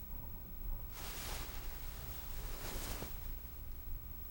Wool Sweater Rustle

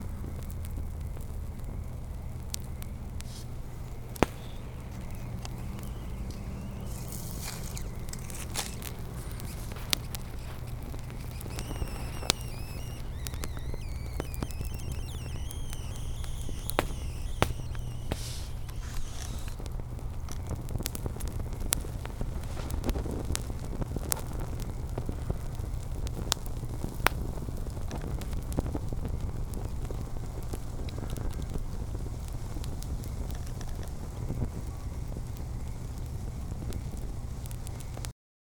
Crackling And Popping Night Time Fire

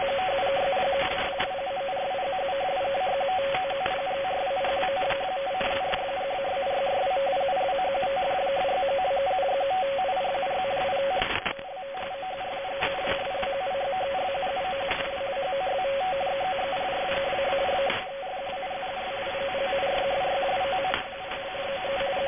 Data Stream

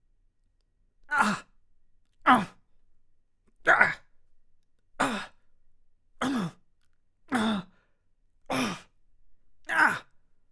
Short Male Pain Grunts

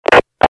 Dispatch Sound Effect

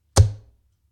Dartboard, dartboard

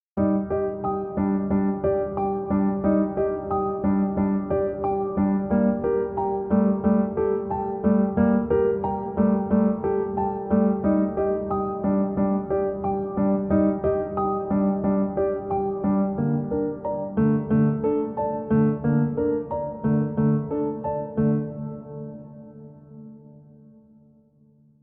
Piano Arpeggio Mess

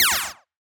Laser

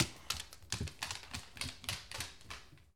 Dog Walking Away

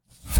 Box Open

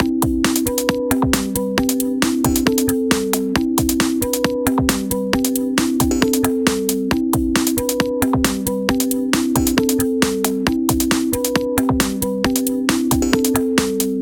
135 Ravey Game Loop

